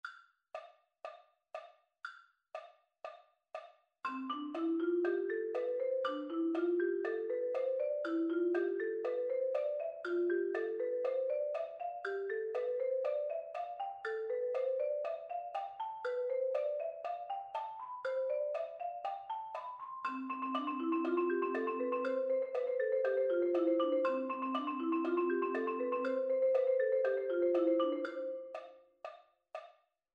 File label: Double Beat 120 bpm Mallets